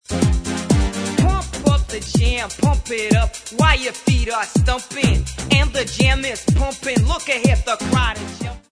Roland TR909; syntezatory

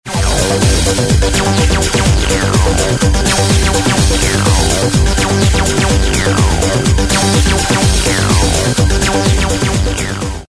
Roland TB303; syntezatory

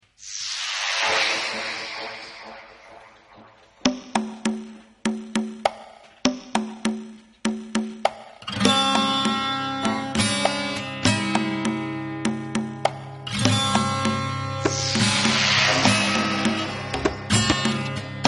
orgiastic trance

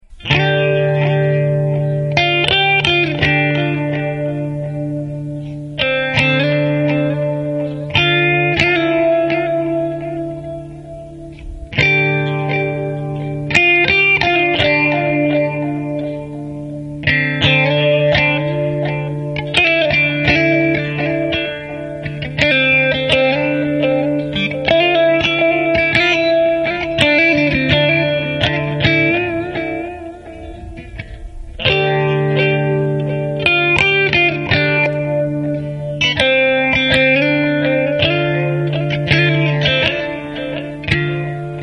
Jazz Chorus

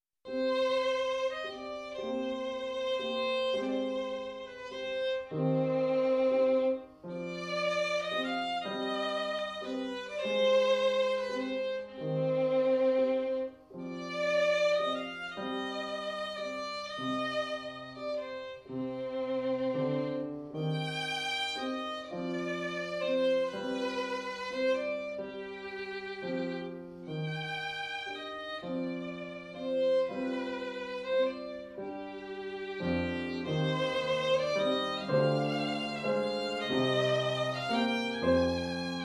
violin before

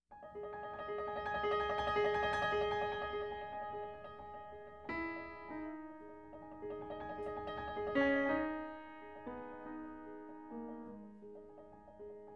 piano before

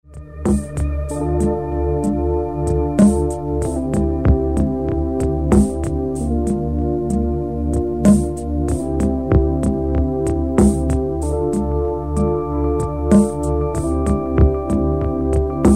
Prophet 5, syntezatory

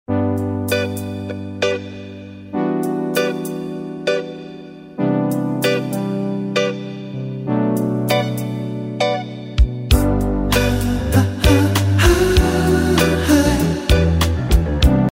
prophet5; syntezatory

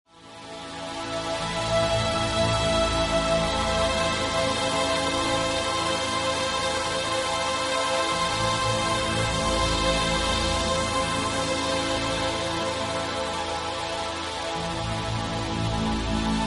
The Hellish And Heavenly Pad With Water

